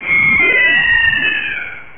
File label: FALL; Internet 1996 World Exposition; Japan; Panasonic; funcity; tokyo; rescue